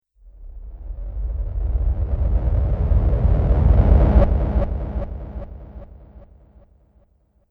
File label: Underground Runble Sweep With Echo, whoosh